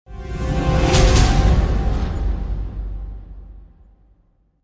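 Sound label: Crescendo Brass 'n Hits; Brand X Music; Brand X Music Catalogue - Editor's Essentials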